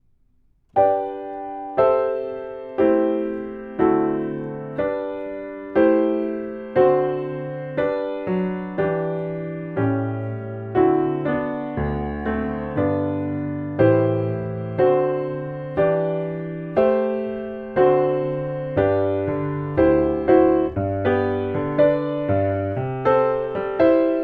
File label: Piano